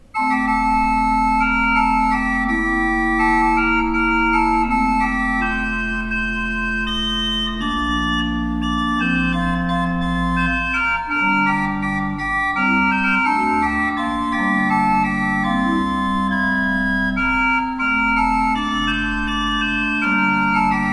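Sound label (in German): Orgel, pfeifenorgeln; Fleiter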